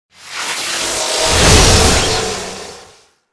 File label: Dispel field